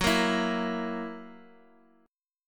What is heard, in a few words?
F sharp 7th